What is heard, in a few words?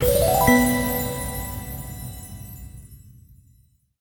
win Medium; netent; wishmasteroct